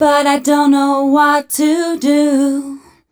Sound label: f 132 mel, Vocals, fem